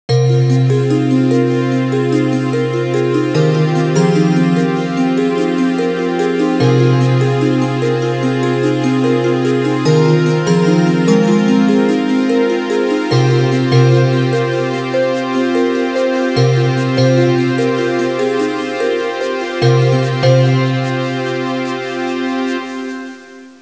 suonerie musica ritmica

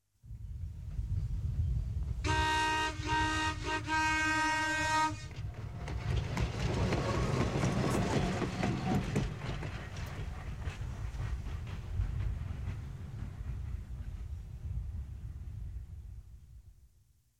TRENO PASSAGGIO; trasporti; treni

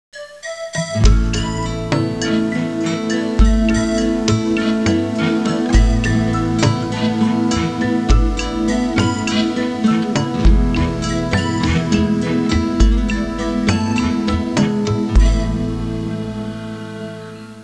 suonerie musica hawaiian

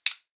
suoneria botton; beep

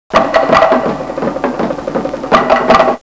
suonerie musica ritmica; rhythm

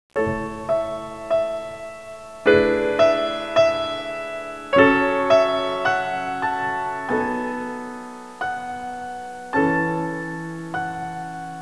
suonerie classica; musica classical

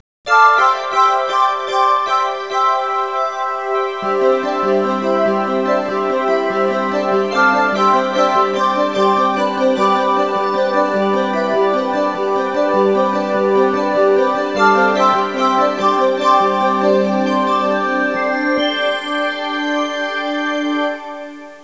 suonerie musica pop

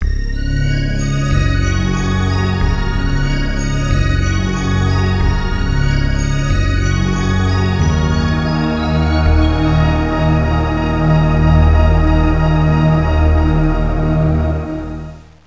audio suonerie musica mistica

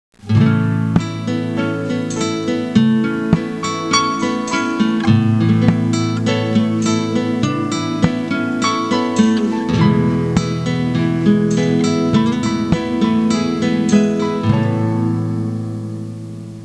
suonerie musica hawaiian

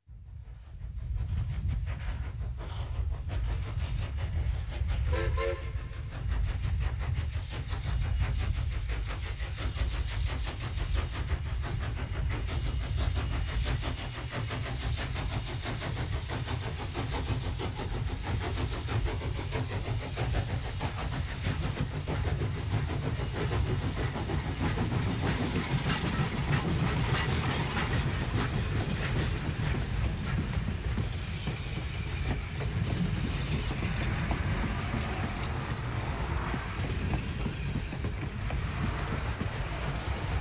TRENO A VAPORE PASSAGGIO E FISCHIO; trasporti; treni

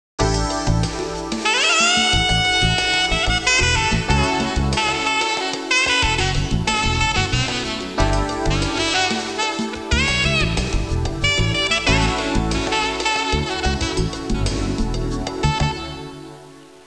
musica urban

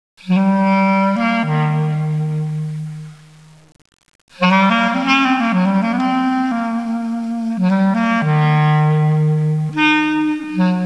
suonerie musica jazz

